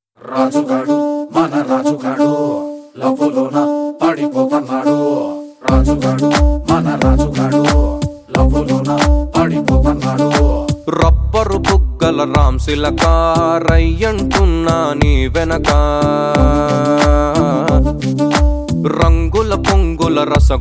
Ringtone

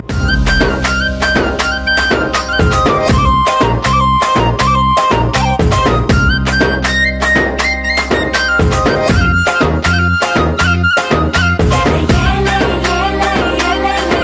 BG Tone

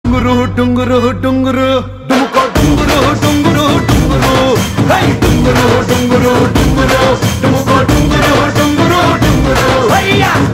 Ringtone

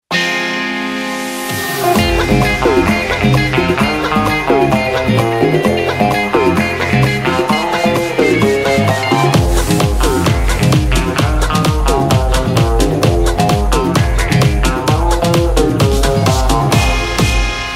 Instrumantal

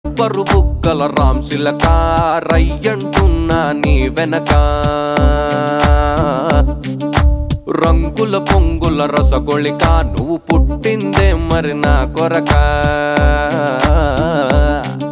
Ringtone

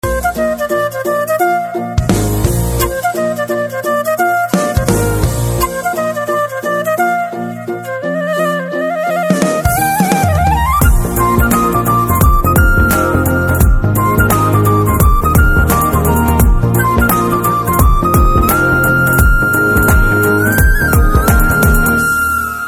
Flute Tone

